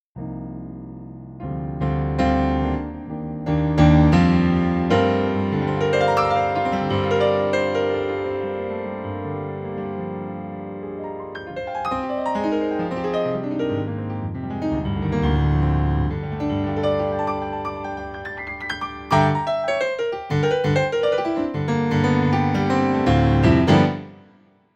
Yamaha Pia

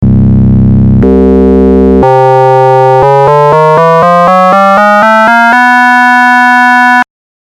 phs FM

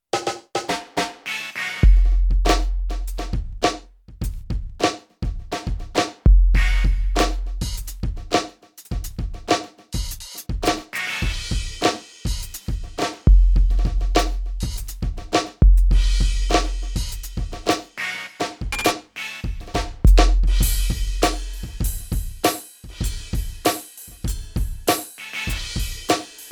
PEARL eMERGE Electronic Drum n Bass

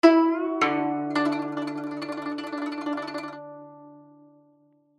Guzheng; Phoenix Orchestra

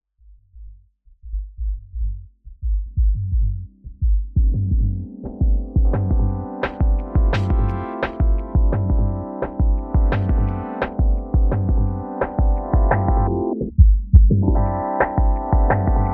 Dials Filter Low Pass Comp; AudioThing Hainbach Bundle

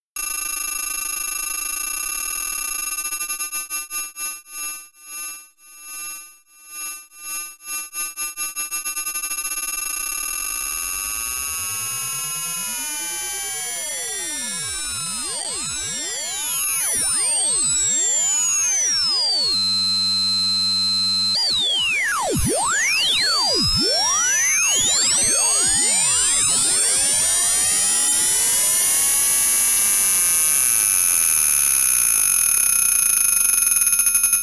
iVCS3 RingMod Sine Saw

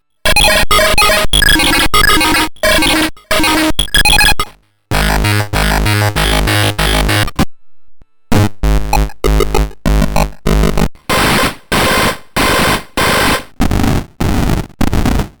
Drums Chip Tune Presets; Error Instruments

